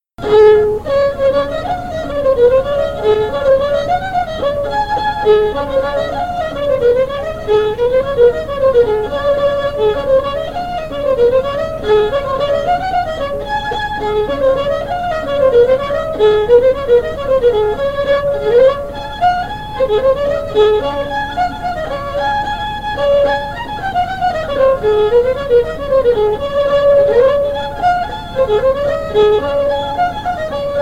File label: fiddle; corn reeks; SeamusGrantFestival